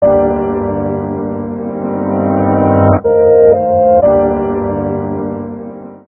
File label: Depressive Ringtone